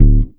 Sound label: HEY HEY BASS; Sampleheads - Ultra Freakin' Bass